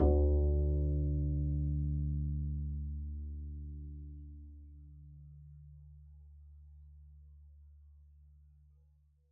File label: pizz d2, double bass